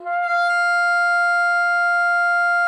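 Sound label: bassoon mezzo F5; Xsample VOL-6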